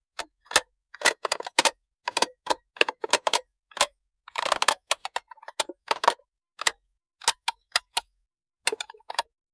casette tape player old, buttons

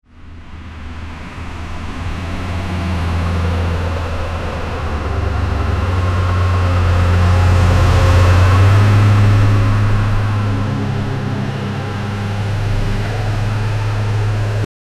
heavy truck rides on highway

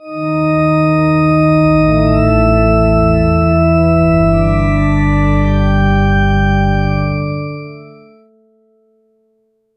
a victory fanfare with a

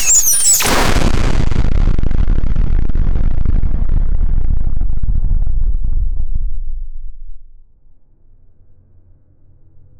massive energy pulse shoc

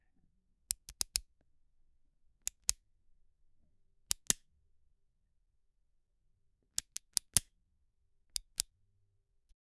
closing pen cap close pro

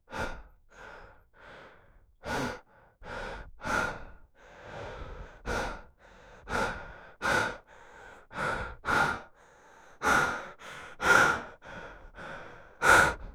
18 years old young man, breathing

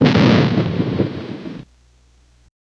single firework exploding; event

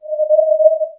non melodic

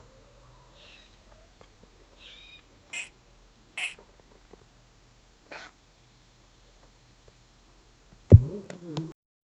a cat jumping on a; animals

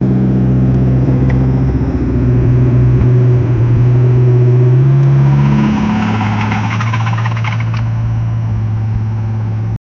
vintage car engine sound; automobile

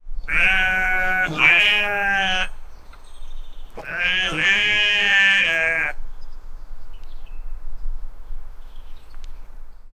goat bleating with ambien

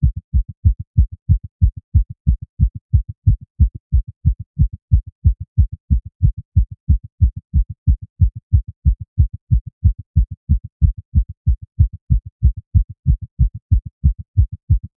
fast paced human heartbea

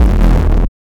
bass with a grunge effect

